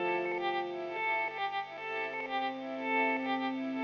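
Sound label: electric guitar, ethno, 125, A; eJay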